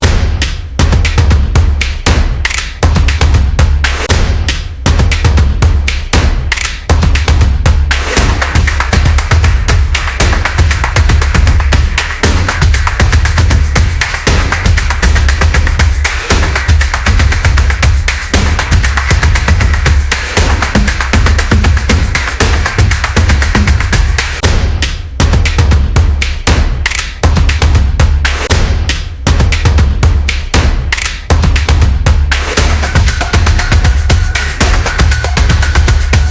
Stomp Intro, energetic percussion music

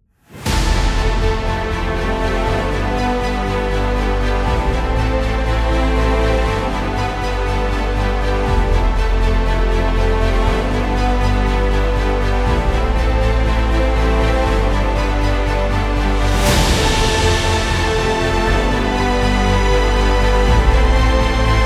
Cinematic, epic background music

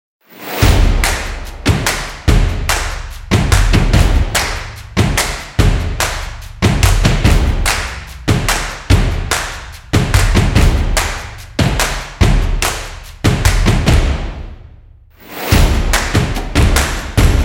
Stomp Drum Percussion; energetic

